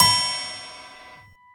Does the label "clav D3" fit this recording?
No